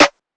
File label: Snare - D; Lofi; Eternity